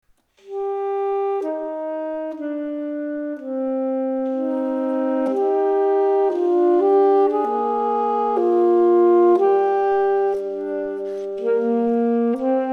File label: Duet